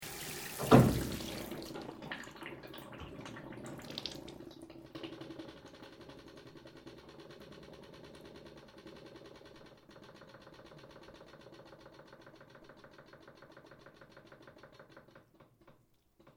SHOWER SYSTEM JAPANESE WATER LEVER DEACTIVATE WATER DRAINING, Japanese bathroom appliance